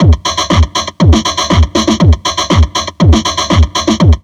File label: Drum Loops Crazy Processed